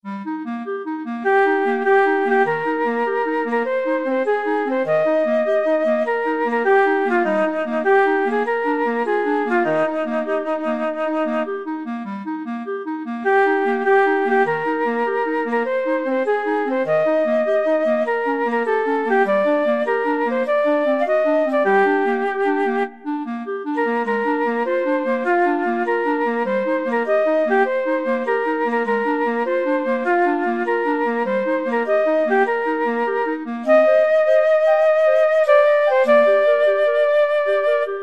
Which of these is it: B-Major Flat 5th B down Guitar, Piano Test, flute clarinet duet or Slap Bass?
flute clarinet duet